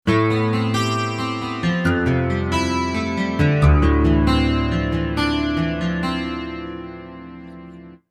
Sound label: Bling 6 String, Guitars; PC3